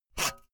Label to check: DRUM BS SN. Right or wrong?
Wrong